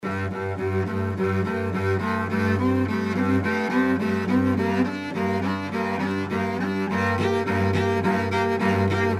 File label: Cello; Agathe Issartier